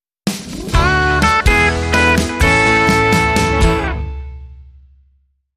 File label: Clean Melodic